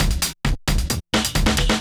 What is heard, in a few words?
drums, 132 Fmin, Hardcore Breakbeat; Big Fish Audio; Hardcore Breakbeat Action; Warned